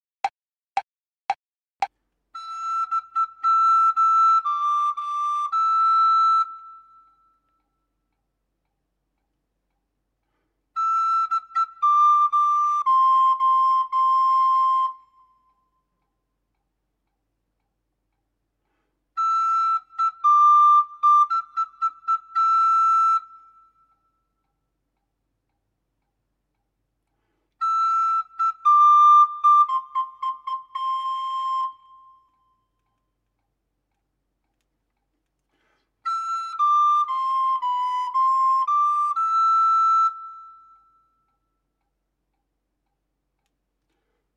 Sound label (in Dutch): Speel mee met de hoge E